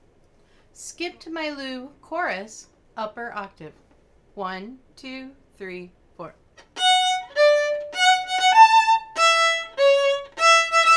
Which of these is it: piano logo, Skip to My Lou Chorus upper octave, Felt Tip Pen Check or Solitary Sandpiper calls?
Skip to My Lou Chorus upper octave